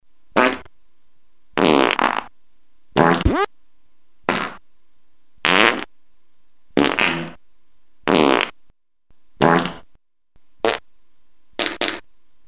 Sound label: fart montage